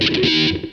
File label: METAL STAB; PlatinumPhattCD2; E-MU Producer Series Vol. 8 – Platinum Phatt